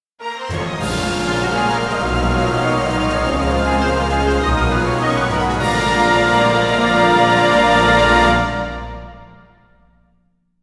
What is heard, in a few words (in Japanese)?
ファンファーレ; 例大祭SP